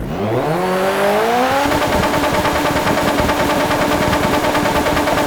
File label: rev, vehicles cars; lwcars; ford rs200